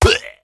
死亡声; 唤魔者

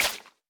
湿海绵 踩踏声

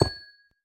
紫水晶放置声

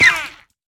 海豚受伤声

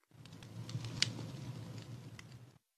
营火燃烧噼啪声

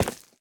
凝灰岩砖踩踏声

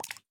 青蛙伸舌声

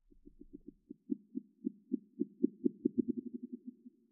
待机声; 嘎枝之心

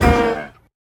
嗅探兽受伤声

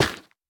菌岩破坏声

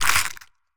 嘎枝之心生成嘎枝声

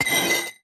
砂轮运转声

